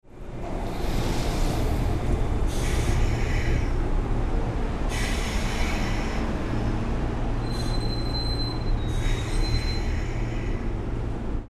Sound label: train